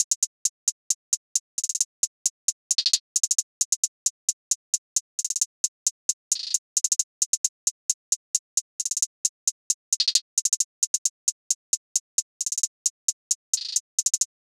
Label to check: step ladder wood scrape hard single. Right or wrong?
Wrong